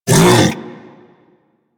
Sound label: Predasite Vocal Tranquilized Grunt; Warframe; Hunting Critters